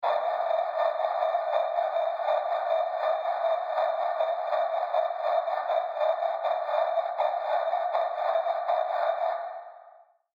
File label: Scrambled Radio Signal Tuning Static; Scrambled Radio Signal Tuning Static Scifi Technology . Science Fiction; Noise; Electronics; BBFX